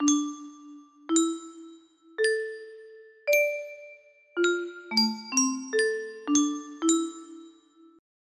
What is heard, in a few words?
crystal music box